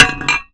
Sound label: Pipe Collision; zps; Foley